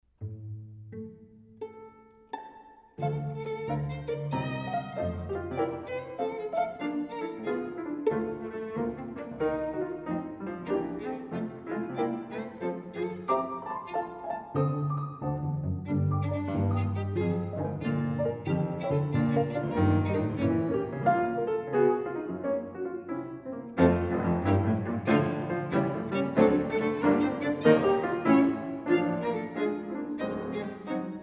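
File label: piano trio; Huss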